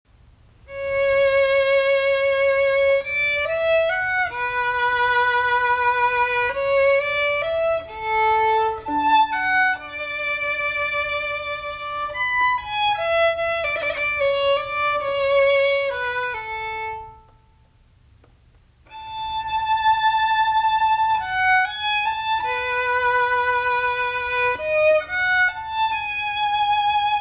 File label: Solo Violin Arioso; Just Divine String Ensembles